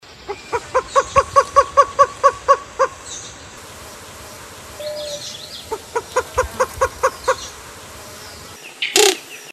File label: Cuckoos